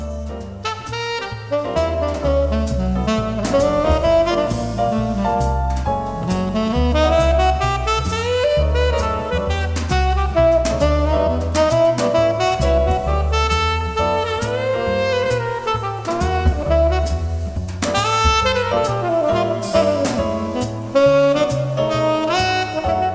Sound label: jazz band